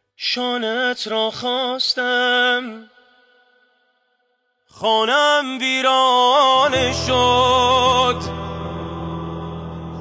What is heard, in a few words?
music sad; آهنگ غمگین گریه آور مخصوص اینستا